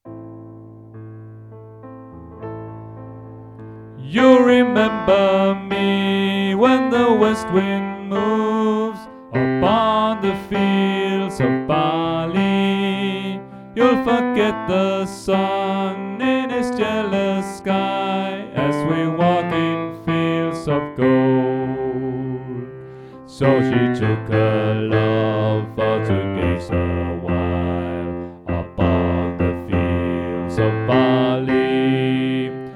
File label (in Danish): Bas; oevefiler